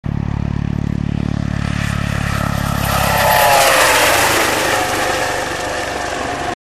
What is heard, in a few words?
EC155 fly by